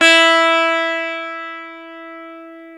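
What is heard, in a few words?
E 12 String guitar; Club-50 - Foundations Roland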